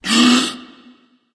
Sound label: breath; cuchulainn